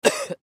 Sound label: Human Cough; Cough Light Element Male 25 Yrs; Human Cough Light Element Male 25 Year Old; Sick; Blastwave